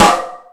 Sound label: House Machine, E-MU Pro-Cussion